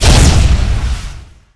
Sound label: laser damage; weapons; galak